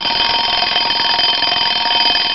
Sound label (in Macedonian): alarm casovnik